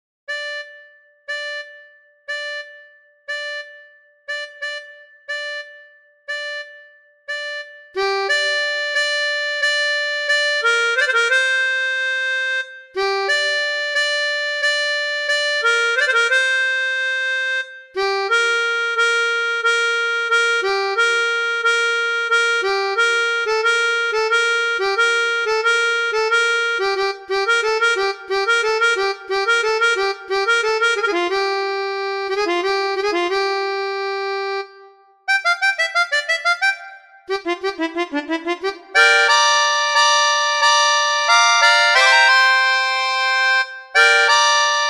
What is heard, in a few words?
melodica; Gayane act tune